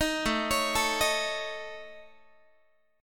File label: A Major Flat 5th A Guitar, chord; AMb5; arpeggiated; 18,17,16,19,19,x